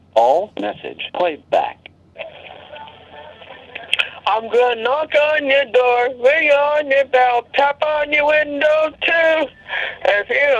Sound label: knock on door